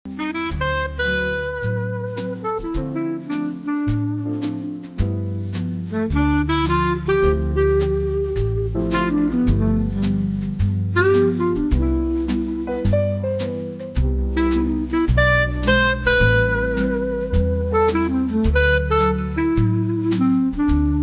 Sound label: Old Jazz Club Piano Saxophone; Timeless 1930s 1940s; Classics